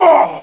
PAIN; quake; player

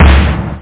D-20 SNARE; 8,363 sample rate

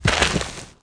swordsman fall

